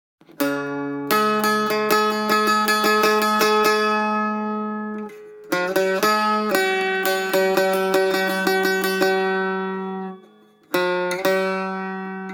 Ceremony and Celebration strings, bard instrumentals; assassins creed odyssey gamerip 2018